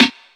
dry acoustic snare b key

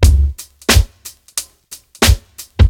89 bpm drum loop c sharp key

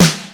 raw snare one shot e key

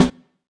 acoustic snare sound E key

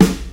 warm sounding acoustic snare sample f sharp key

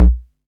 deep kickdrum sound a sharp key; kick drum samples

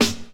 clean snare a sharp key

